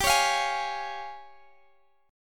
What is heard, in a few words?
Db Major G; strummed